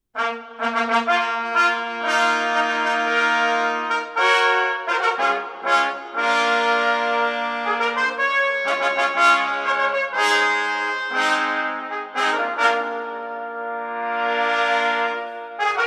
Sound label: Fanfare, trumpets